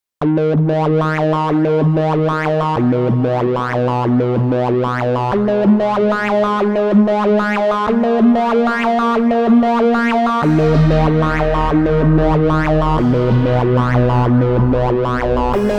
Drum Mega Bass

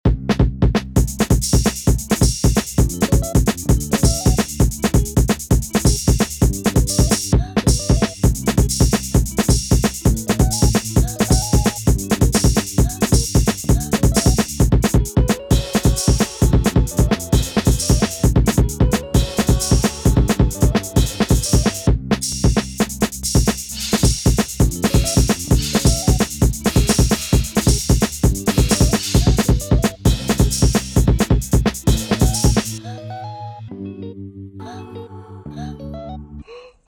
Rock Drums

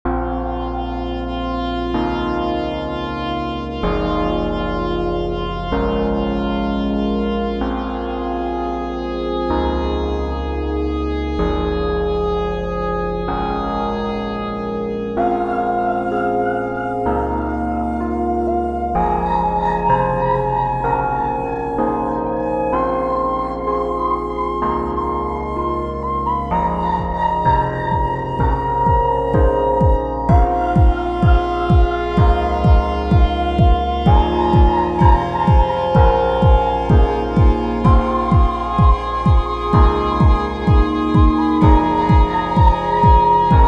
cold pizza ghost ambient

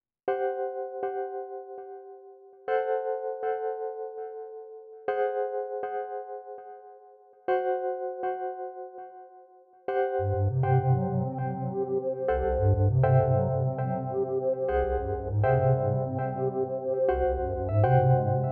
Bells Whistles

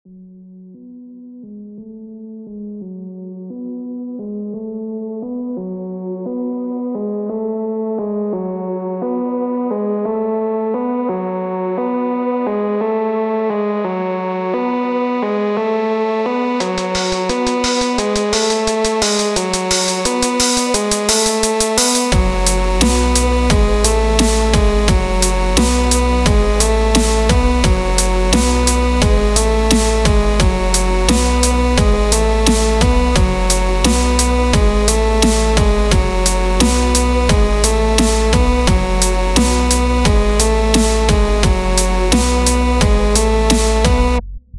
dubstep song

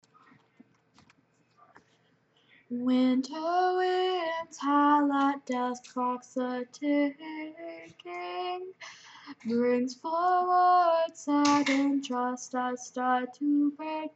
acapella

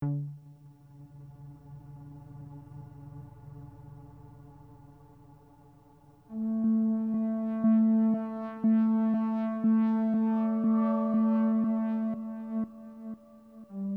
Flock of Electronic Surveillance Birds

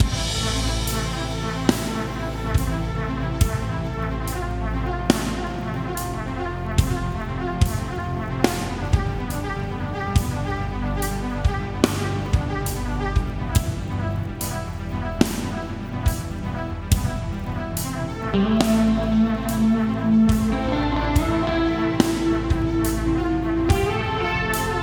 Shuffle

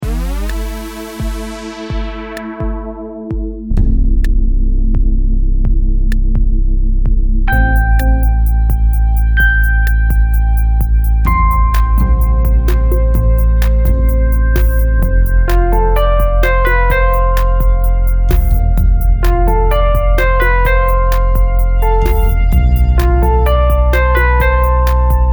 I used drums in this song

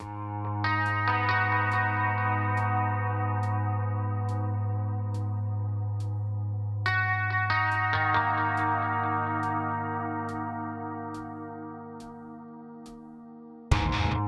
Trying Some Badass Guitar Samples

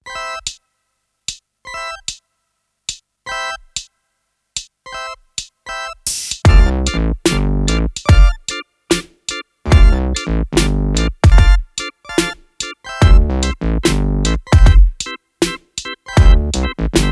drum groove

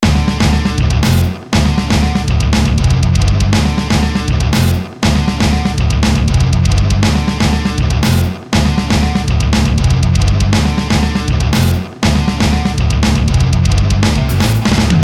Drums of War

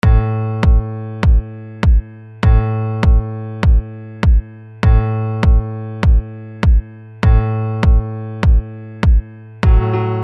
Abstract Piano Entry

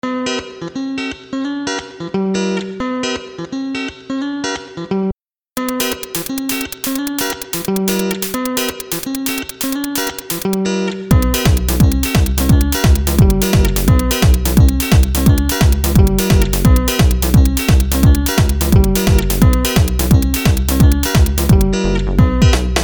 Quick Sampled Guitar Thing

